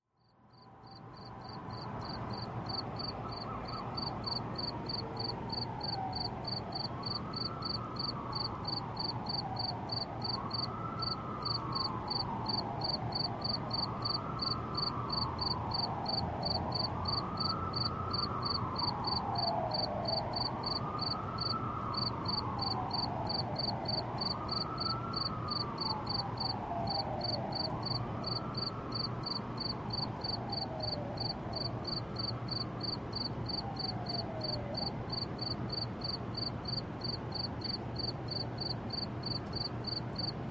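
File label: Cricket Close Up City Setting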